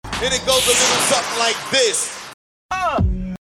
uhh

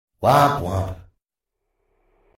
womp womp

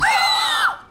thumb gasp; superstore; amy

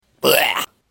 blegh

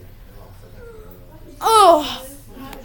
augh

